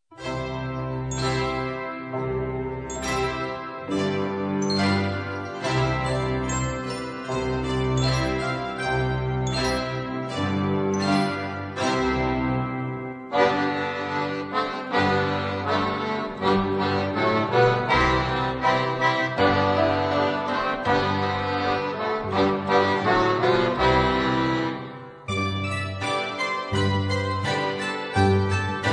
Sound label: folk